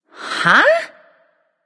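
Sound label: confused, female; smite; voicepack; hera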